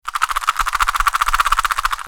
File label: spin